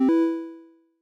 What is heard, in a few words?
KB Noise On Quiet; FastTextPager